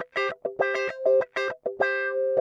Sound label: guitar